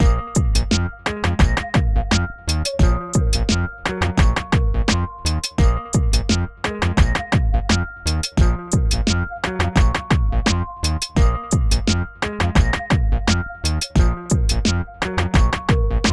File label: Cold Smooth Rap Beat; Genx Beats